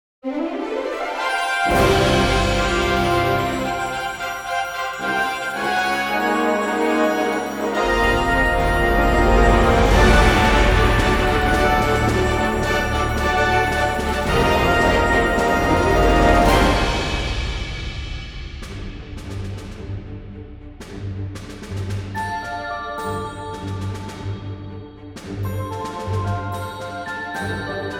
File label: Fanfare